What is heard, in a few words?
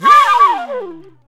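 flutter, flute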